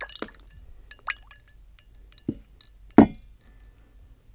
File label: Glass Pour Set Down